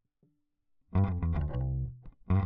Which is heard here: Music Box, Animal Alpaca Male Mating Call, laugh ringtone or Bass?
Bass